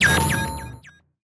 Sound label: new Menu Confirm